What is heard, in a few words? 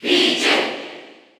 Cheer English PAL; Pichu; SSBU